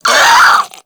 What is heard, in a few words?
Infested Crawler Pain; Warframe